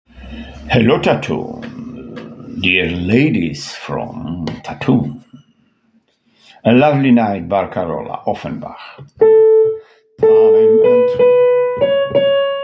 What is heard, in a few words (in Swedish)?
Barcarole sopran; Barcarolle sopran